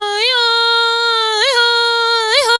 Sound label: VOX